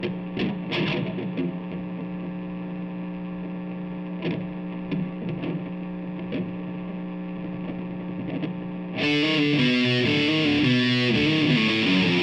GTR

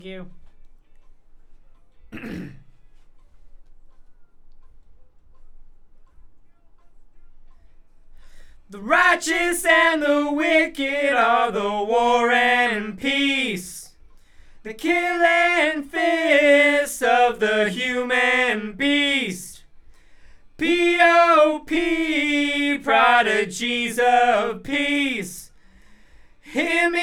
BG Vox

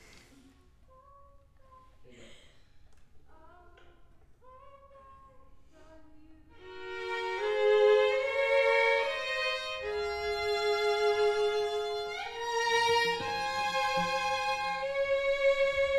Cello

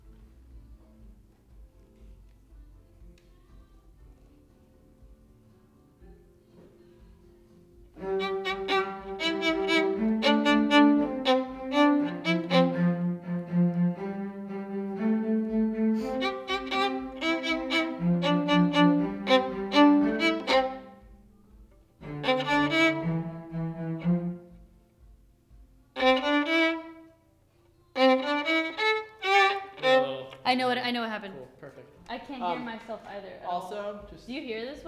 Violin

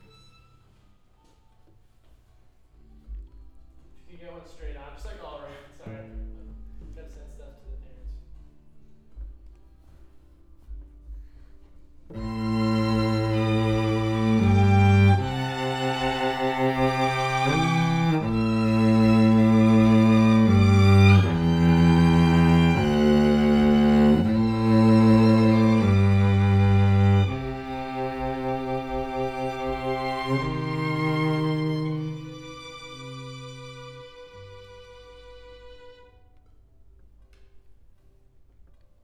Cello

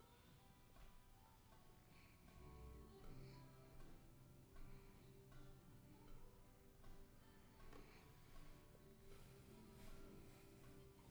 violin

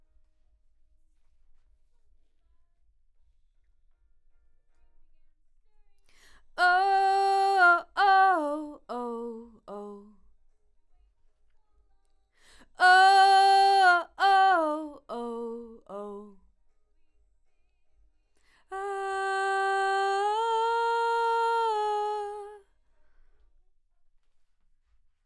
Vox start new

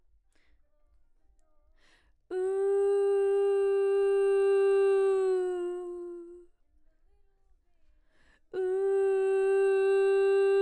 BG Vox

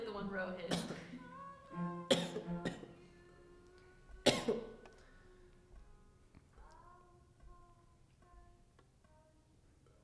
violin

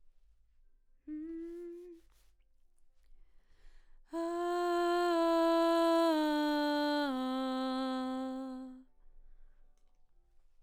BG Vox

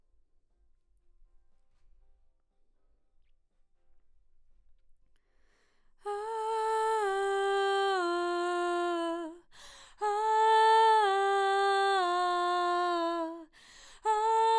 BG Vox

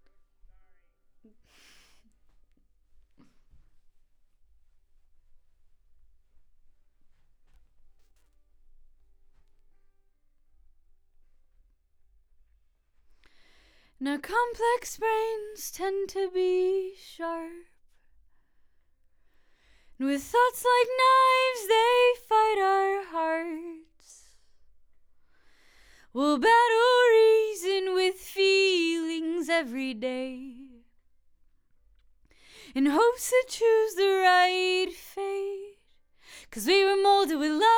Vox

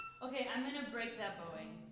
Violin

